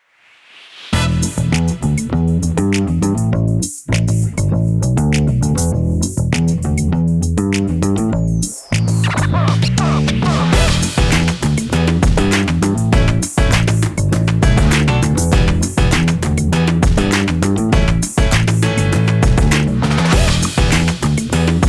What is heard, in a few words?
stock music